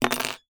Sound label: screw or nail down on hollow surface; industrial